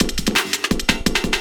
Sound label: LOOP, 170 BPM, Drum & Bass